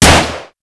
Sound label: Shot; Warframe; Weapons; Sicarus